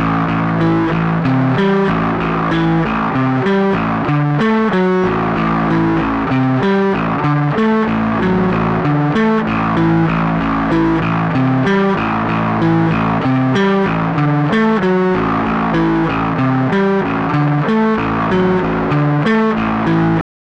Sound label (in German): plektrum